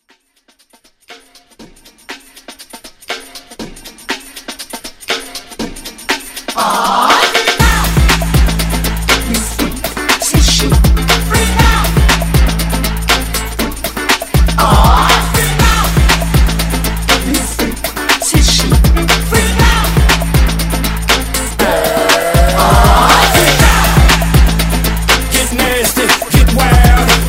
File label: Bmore Redrum